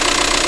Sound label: skoda, Vehicles